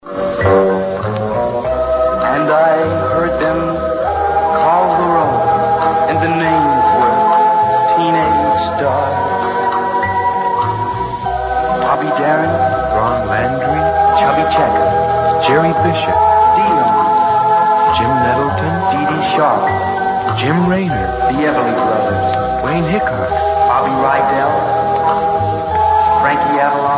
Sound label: cymbal; WDRC